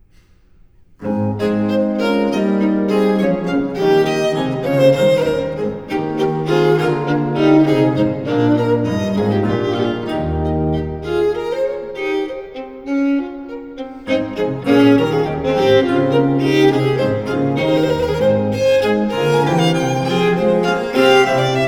Violin Sonata in B-Flat Major, Op. 5 No. 2 V. Vivace; Corelli; Violin Sonatas Op. 5; La gioia; Lina Tur Bonet; Musica Alchemica